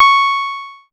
C#, PIANO; Big Fish Audio; Synclav FM

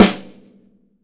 MAPLE SNARE

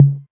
808 Tom Mid, Drum Machines; DrumstationV1

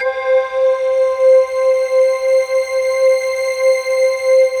orient C5

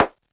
Clap Analog Knock; Lost Tapes From Mars; Clap & Rim

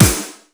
MONDO SNR, METALIC DRM; Crypton - DrumBase5000; METALIC DRM2

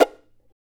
CHARANGO FX

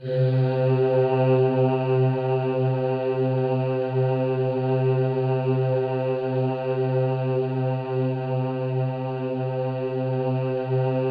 C3, Synth Leads

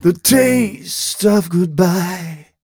m 128 mel e-flat, Vocals

